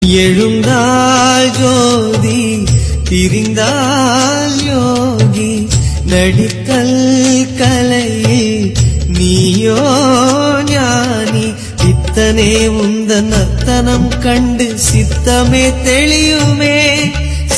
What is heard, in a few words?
Om nama shivaya